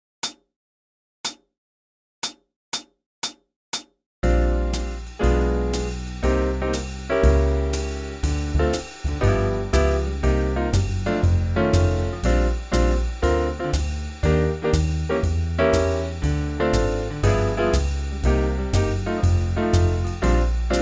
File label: Rhythm Changes in Concert Bb; TMEA Jazz Auditions